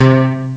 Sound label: bas; Amiga Soundtracker Sample Packs